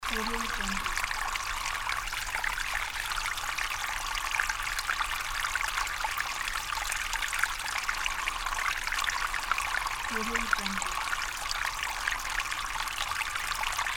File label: stream